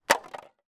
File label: empty drinks can roll or drop on street; foley